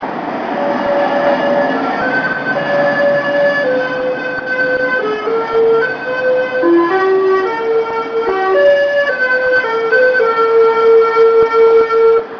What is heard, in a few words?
horn